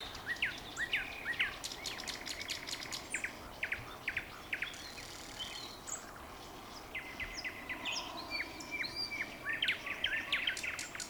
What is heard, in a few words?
nightingales, zapadny roundabout